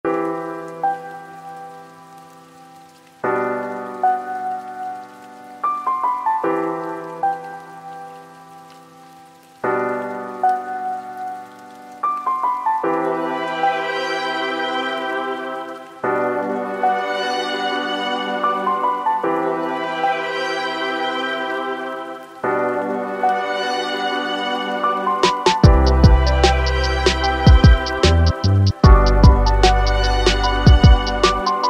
Rainy Day Loops Sad Chill Lofi Vibes

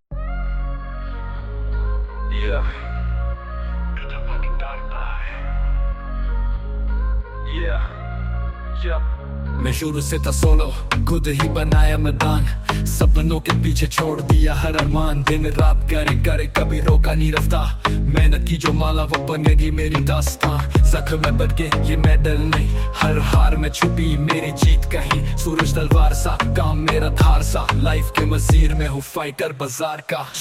Motivational Rap

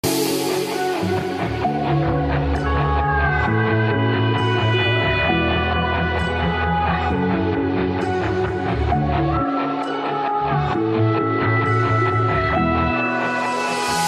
Pop Rock

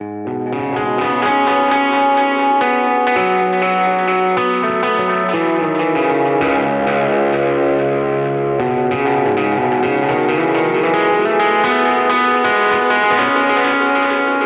Instrumental Version

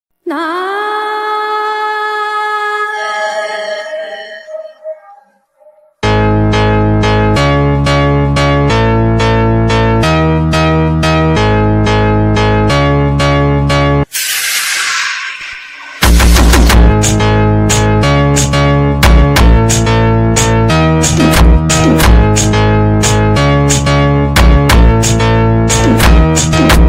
Trapz PHONK

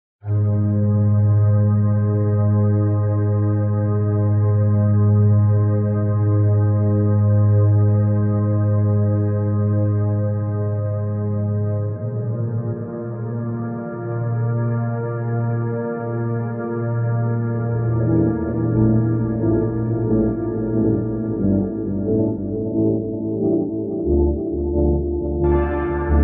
Organ Theme Experimental; Cannes

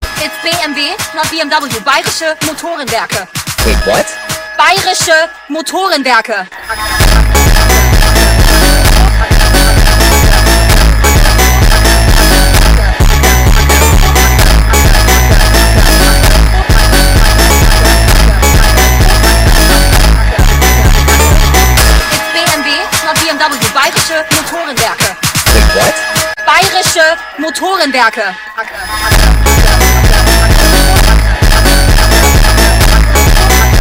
FUNK

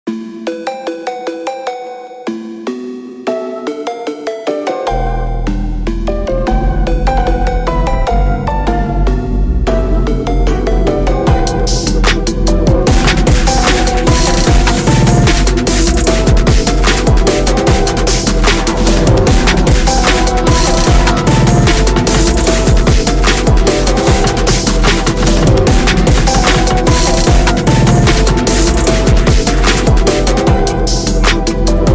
FUNK, Phonks

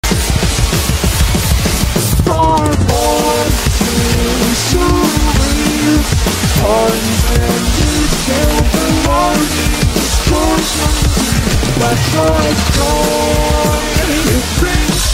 DRUM REMIX

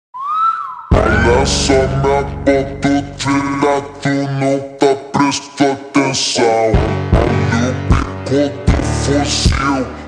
Hyper Slowed Reverb

